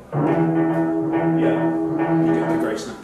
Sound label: Bassline Layer 1+2; Whooverville 5